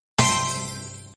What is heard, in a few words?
end of free spin charge